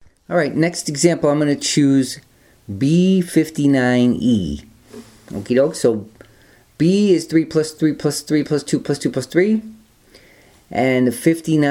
rhythm generator 2 bar example

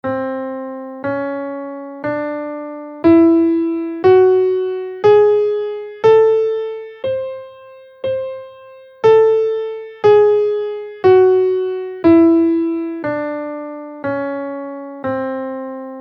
ultarlocrian bb3 scale in C

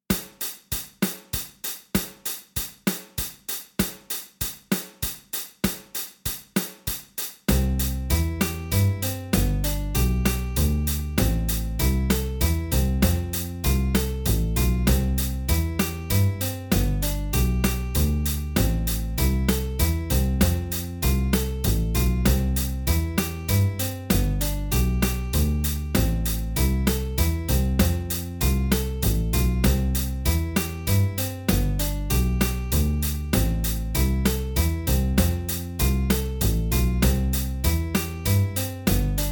3 vs. 2 backing

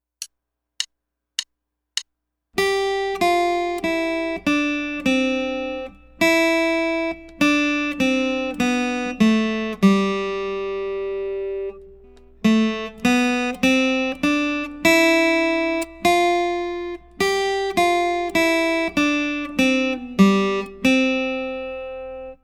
Descending

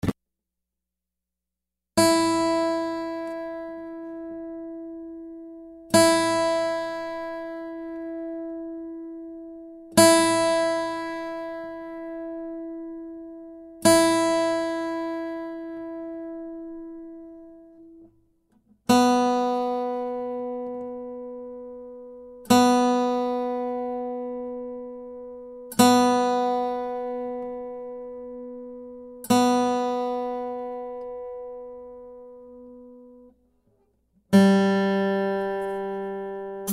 Guitar

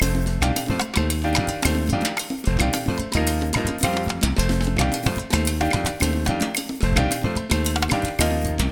songo

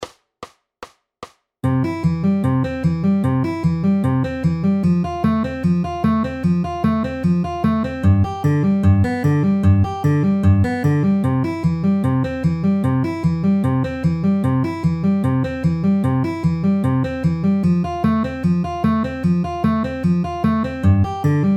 C F G C outer inner ring middle at 150 bpm

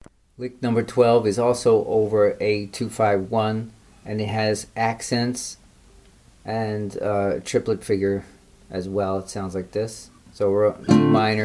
jazz licks

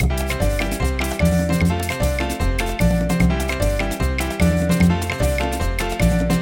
merengue loop

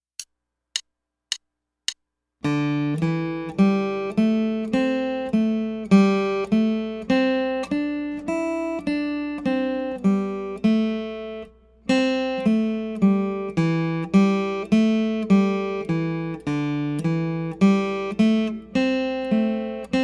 Native Flute

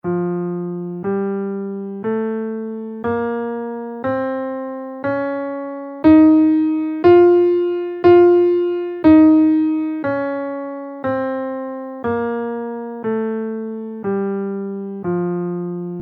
mixolydian b6 scale in F

